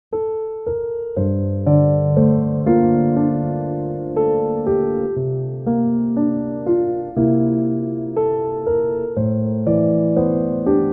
Raindrops

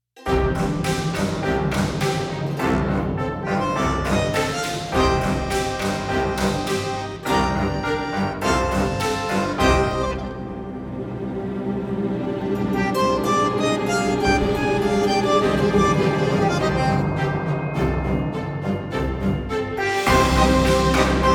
Monster Marching; 예능 Tension

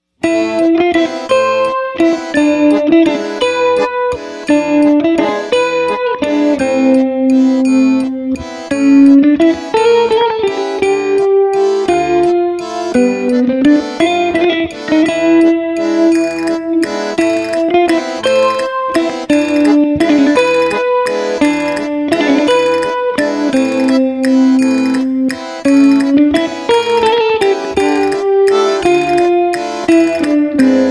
SOJU Waltz, Comic; Comic 드라마

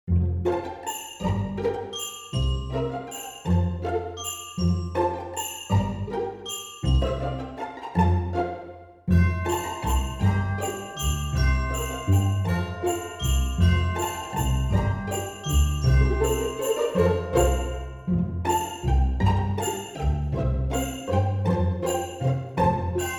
Christmas Style

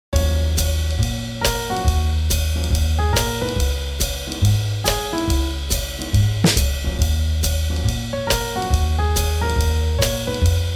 Swing Jazz

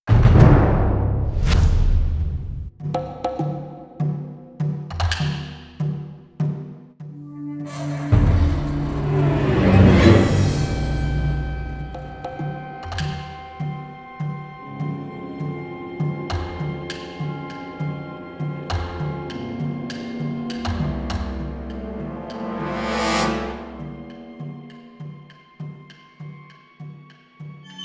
Ghost drum

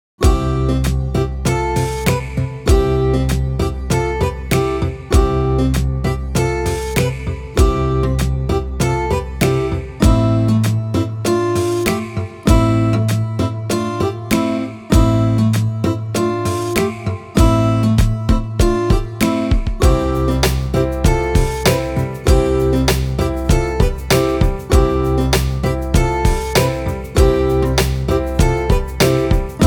Country Feeling

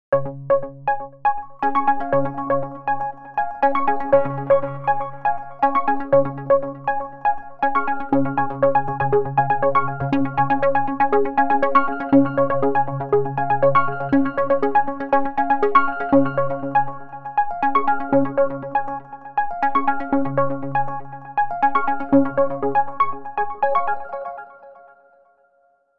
Electronic; USIM; usimmusic season6; 신비

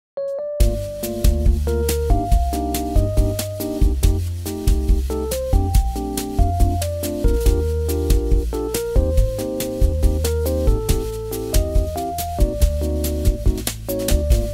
Bossanova

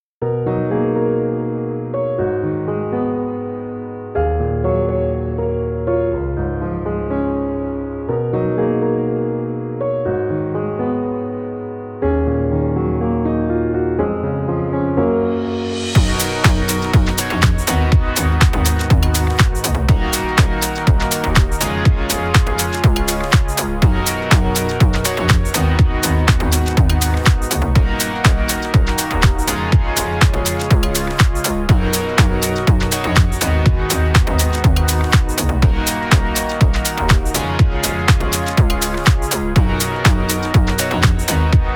First Mover, EDM; 리듬

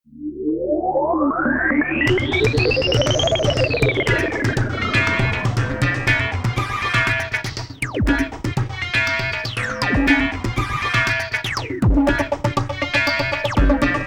Electronic, EDM; 리듬

